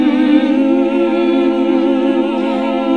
SING, CLASSIC VOX; Best Service; Hallelujah

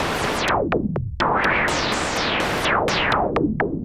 SH101 Clocked LFO Rhythm (125BPM)

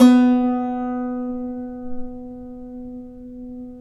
SAZ B 3; Spectrasonics; Hans Zimmer Guitars VOL-2

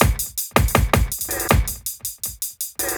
drums, Hardcore Breakbeat 161 Bmin; Big Fish Audio; Hardcore Breakbeat Action

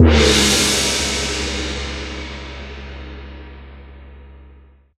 Gong; Zero-G Jungle Warfare 1, 2 & 3; 90 s Sample CDs

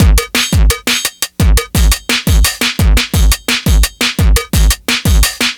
172, breakcore

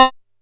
C5, Bass; E-MU Producer Series Vol. 6 – Analogia Project; Boom da Bass

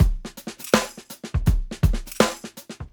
SIX POLY TIGHT, TIGHT KIT; Tuna Beats

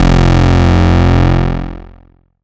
bass F1, PPG Wave 2.2

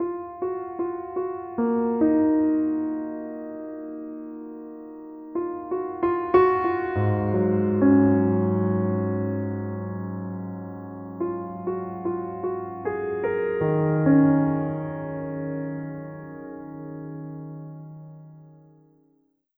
Piano

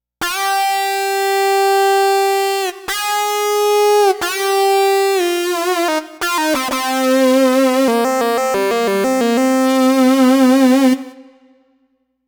Lead Synth Lick; Dr. Fink's Funk Factory

